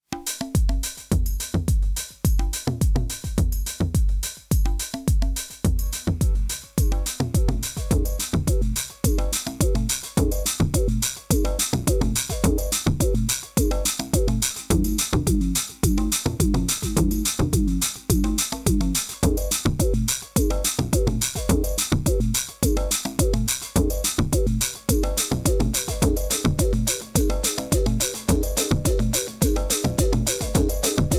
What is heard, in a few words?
Frequency Shifter Drums; Behringer Bode Frequency Shifter 1630